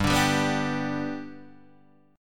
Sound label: G Major, Guitar, Half Step Down